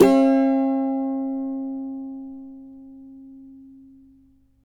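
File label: D major, Guitars; Spectrasonics; Hans Zimmer Guitars VOL-2; CAVA CHORDS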